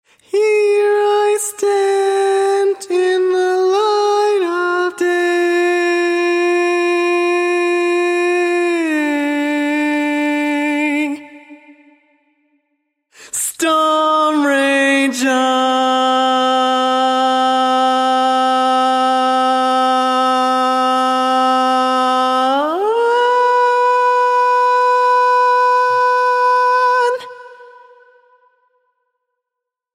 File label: Tenor, barbershop